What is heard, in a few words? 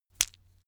stone gravel clatter hit; natur-tiere; steine